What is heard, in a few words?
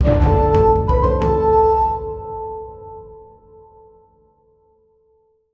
card follower; Armello The Bandit Clan